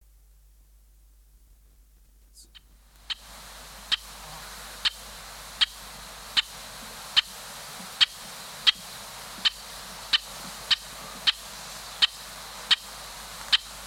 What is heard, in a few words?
rusty blackbird, songbirds